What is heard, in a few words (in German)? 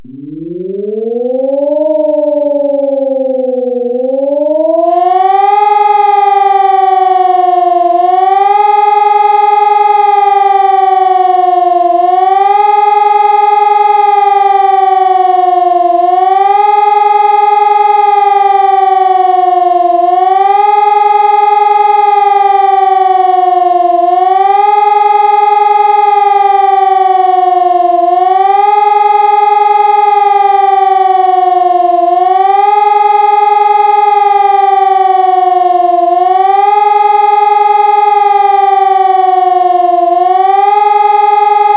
Warnung, Test aller lokalen Sirenensysteme